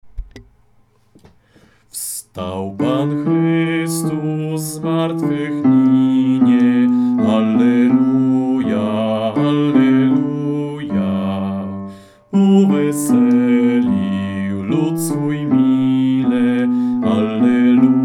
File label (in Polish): bas; Wstal Pan Chrystus; pasyjne; meskie